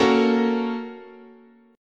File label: Bb Minor Major 7th Sharp 5th, guitar chord